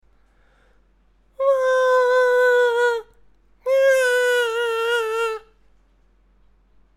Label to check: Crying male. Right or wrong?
Right